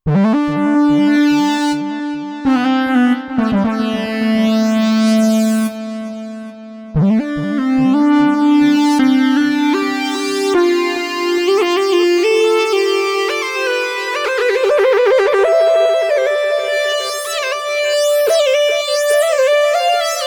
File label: Modal A8 Searing Lead